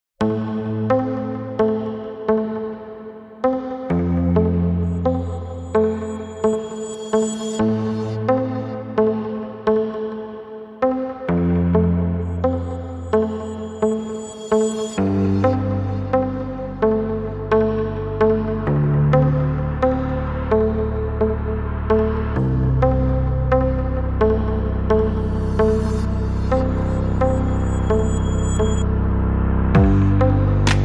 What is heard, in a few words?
Glitch, No Ethnic Synth No Stutter Synth Mix